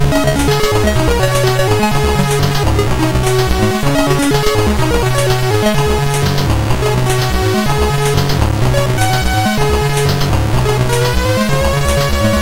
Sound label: Zombies, sid MOS6581R3